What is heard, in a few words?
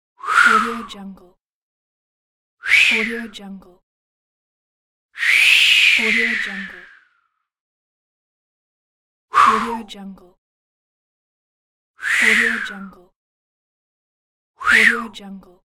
human mouth swoosh pack